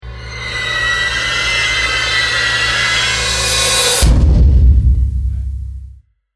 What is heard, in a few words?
Movie Impact Psycho; Miscellaneous Impact Sound Effects